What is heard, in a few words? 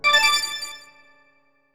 Silver Coin; Diddy Kong Racing